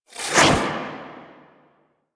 Archon Whip Spin Whoosh; Enemies; Weapons; Warframe; The New War; Nira

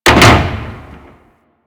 Derelict Mover Metal Clunk; Ambience; Props; Warframe; Orokin Derelict

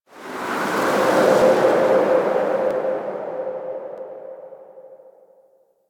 Exterior Forest Wind; Warframe; Ambience; Duviri

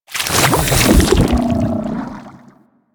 Burrow Goo; Warframe; Hunting Critters; Undazoa

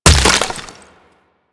Ice Hit; Warframe

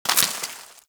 Bullet Impact Debris Wood

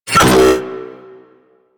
Thermal Shock Cast; Warframe; Warframes; Runner

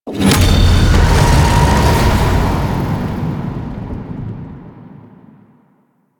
Gate Explosion; Warframe; The New War; Sentient Devourer

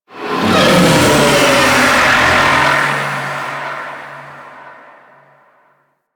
Vocal Attack, Enemies; Warframe; Teralyst

